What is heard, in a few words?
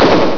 Get hit